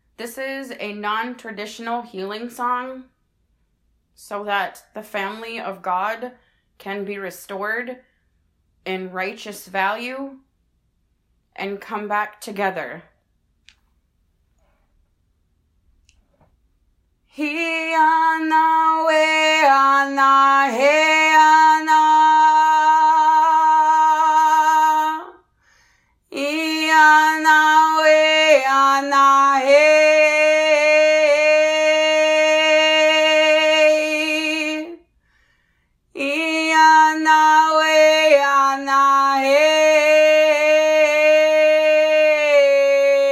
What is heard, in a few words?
Thunder Bird Song